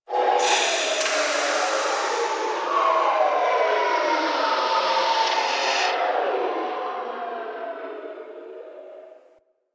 Landscape Construction Site One Off, Ambience

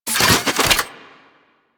Fist Spin; Enemies; DE; Warframe; Tyl Regor

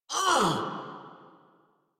Pain, Battle; en; Warframe; Grineer; Catbrow Handler

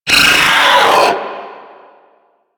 Swarm Vocal; Warframe; Man In The Wall; Limb Vocals

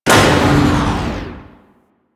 Large Elevator Stop

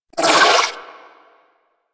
Amalgam Moa Satyr Vocal Pain; Warframe

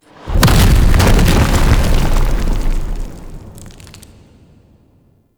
Boulder Crash, traps; Objects; Champion Crypt

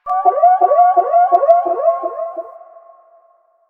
Large Vehicle Alarm Destroy; Warframe; Ambience; Nineteen Ninety Nine

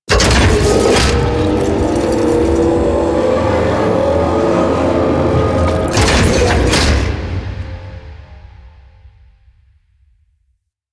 Corpus Ship Temple Pyramid Rise, ambience; Warframe; Corpus Ship Remaster

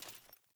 Foley Movement Armour; Sinecure; Melee Grunt; Enemy

